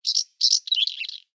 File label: ambient; World of Tanks